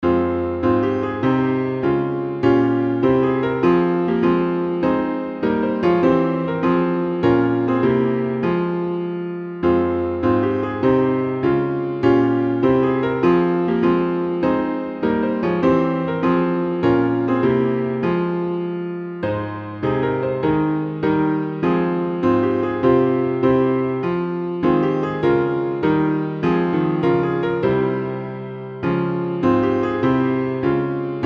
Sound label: Piano all parts; psalm54